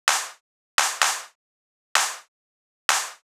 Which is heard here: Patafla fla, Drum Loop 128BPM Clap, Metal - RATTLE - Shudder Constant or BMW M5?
Drum Loop 128BPM Clap